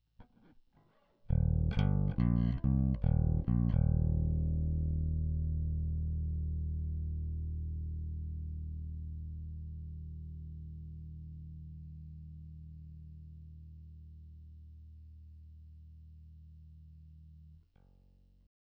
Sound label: Clean Combo